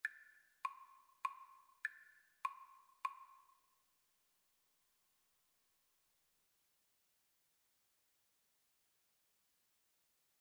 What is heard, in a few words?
elephant, Flute-Clarinet Duet